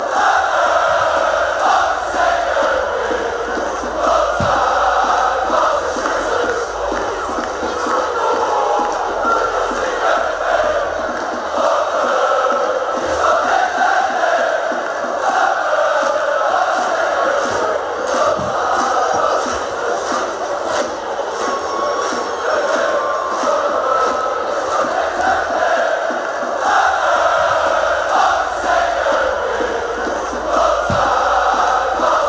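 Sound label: chomed alli singed mit, football chants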